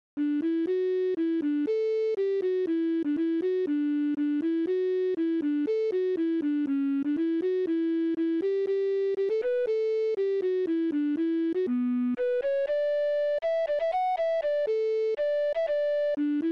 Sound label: scotish tune; Crawshaw ms